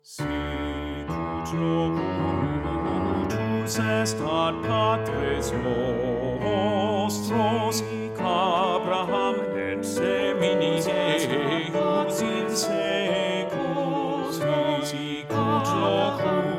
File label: Baritone